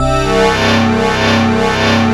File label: PPG HVY WAVE C2; E-MU Formula 4000 Series Vol. 3; Analog Odyssey; VK+ Instruments